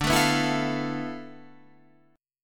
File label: D Minor 6th Add 9th D